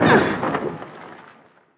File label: Cursed Casket Death